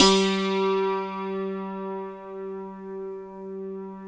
G3, DULCIMER; SoundBytes; Early Patches

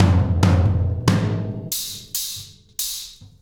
Tom Particle, Processed Drumkits

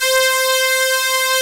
MIX STAB, DANCE; Pro-Rec - Synthsonic; Z1 DANCE

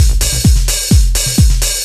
massive hats, Deep House, 130bpm; Zero-G Deep House

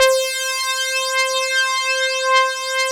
DS8 C 4, Korg; Back In Time Records; Korg Universe VOL-1

